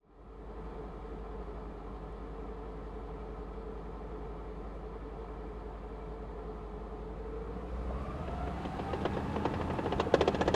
M18 Hellcat Tank Destroyer onboard medium driving reversing stopping tracks right; Pole Position